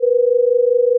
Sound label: 500 Hz plus 475 Hz, 25 beats per second; 500 Hz plus 475 Hz at 100% amplitude